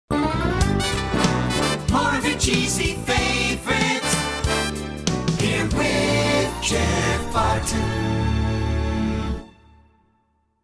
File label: Jinglebells